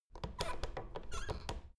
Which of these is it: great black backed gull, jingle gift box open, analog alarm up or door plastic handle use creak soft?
door plastic handle use creak soft